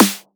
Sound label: 909-style snare